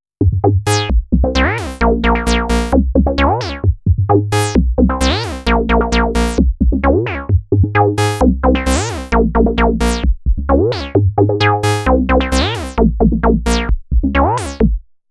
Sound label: Mod Tracks